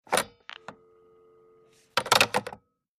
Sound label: Telephone pick - up, hang - up, with faint dial tone ( rotary phone ); Futz Movement